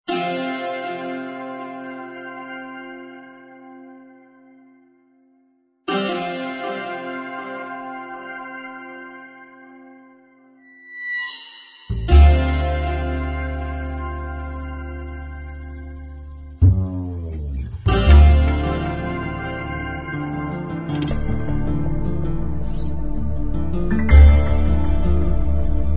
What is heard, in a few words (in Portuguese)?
suspense grega